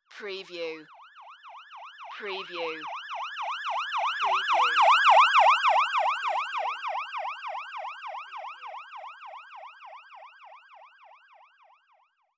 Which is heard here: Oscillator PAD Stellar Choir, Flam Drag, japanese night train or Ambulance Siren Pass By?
Ambulance Siren Pass By